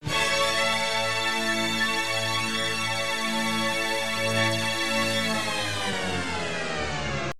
Synth stab; Piranha

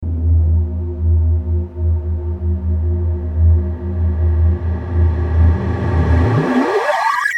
Outro fx; Firedrill; RAPTUROUS

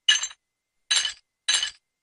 head tap

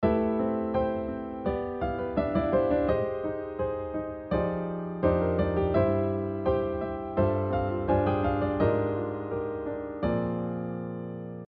harm mel

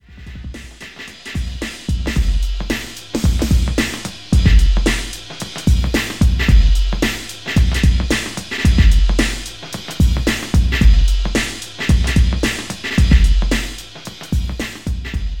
Beat

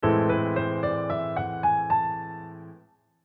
melodic minor mode

